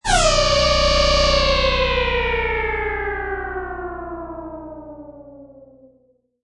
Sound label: Protons Machine Long Decay Short, Weird machine starting operation, operating for few s